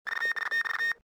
morse code; Soldier Of Fortune II Double Helix; XBOX; ambience

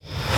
cancel

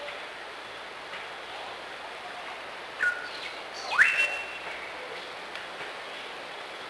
Forest

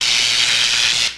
electric arc

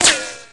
Ricochet, Impacts

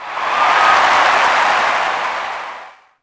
POS Reaction,Crowd; Cars; XBOX

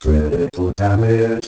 funny; The Elder Scrolls III Morrowind GOTY; XBOX

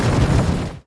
droideka Roll end

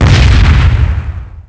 cannon fire; shipcharge; Pirates Of The Caribbean

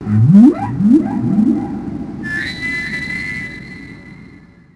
Whale Call; Finding Nemo; XBOX

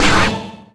extra trick; Tony Hawk's Project 8; XBOX

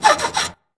Chalk; Virtual Pool Tournament Edition

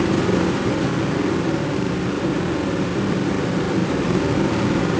cart; Soldier Of Fortune II Double Helix; XBOX

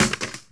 bad part stone, droids robots

